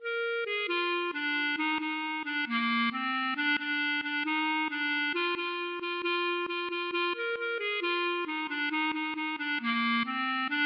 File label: klezmer